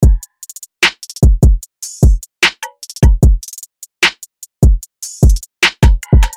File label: Hip Hop & Rap (150BPM)